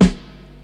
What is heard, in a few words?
verby steel snare drum b key